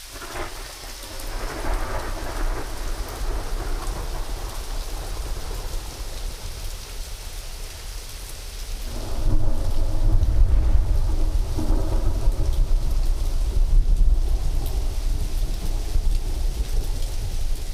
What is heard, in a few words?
long thunder; Rain & Thunder; SFX